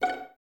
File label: Violins F#5 Pizz, String Ensembles; Miroslav Vitous; 11 Violins